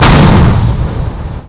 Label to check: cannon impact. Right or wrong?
Right